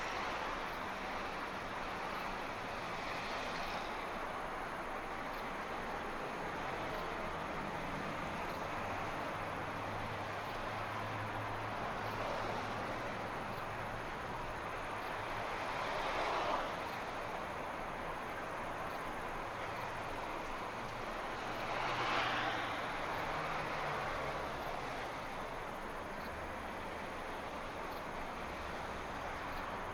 amb highway skyline loop; Blind Drive

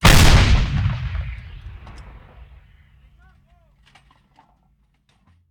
Artillery Cannon Artillery; Sound Ideas; Guns

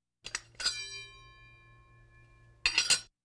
Hospital effects

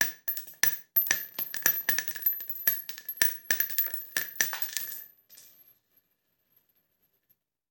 Bullet Shell; Sound Ideas; Guns

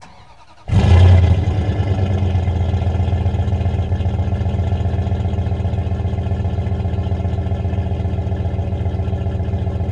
Truck Durango Start Idle; Trucks

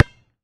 Street Ball Bounce Asphalt; SFX Kit; Street Basketball

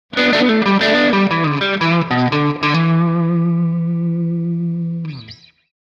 Blues Guitar - Short Solo - Blues End; Hollywood Edge; sound designer's toolkit v2

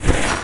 Menu Magic; Menu FX

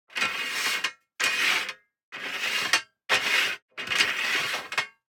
DICE on Metal, Slide, Standard, 4 Four Dice; Samples; ArticulatedSounds; DICE Sfx Party (Extended)

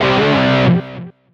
Pickup Guitar Riff; SFX Kit; Pickups

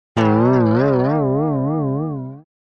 Slide Guitar Comic Springing Vibration Slow; Hollywood Edge; sound designer's toolkit v2

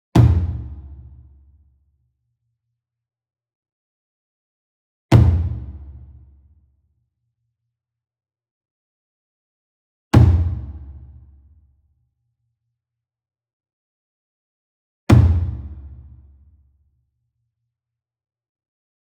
ENSEMBLE DRUM Hit Medium; boom library; Cinematic Strikes Construction Kit

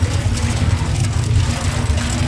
Roll Metal Barrel Loop; SFX Kit; Foley - Misc

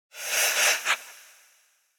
Breath - Demon - Triple Quick Startling, Echo; Hollywood Edge; sound designer's toolkit v2

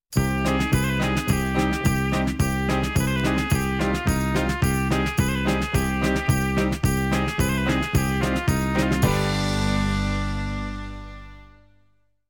Arabian, music

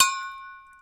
Hit Glass Jar Clink; SFX Kit; Foley - Misc

